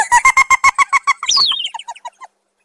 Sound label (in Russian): pikachu smetsya